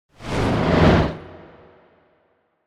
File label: Whoosh Sci-Fi Wind - The Last Wave; Hollywood Edge; sound designer's toolkit v2